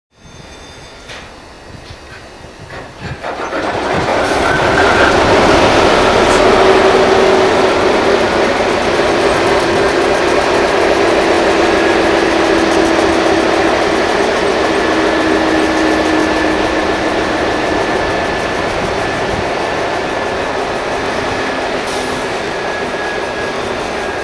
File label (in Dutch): Opstarten; HLD; Reeks 51